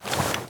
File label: weapon movement sprint; weapons